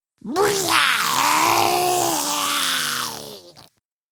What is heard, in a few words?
Arm Pain Grineer Ghoul; Grineer Ghoul Expired; Battle Barks